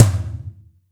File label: TOMS; Zero-G; Chemical Beats